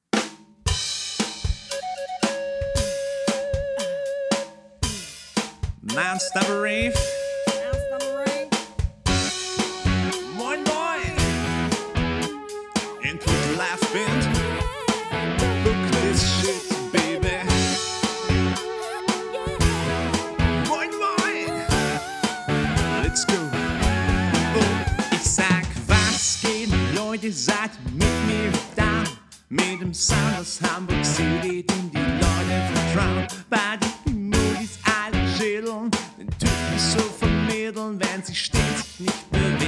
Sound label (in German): Liveband